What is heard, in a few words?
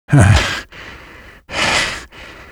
fish hook grunt, fisherman; lostcoast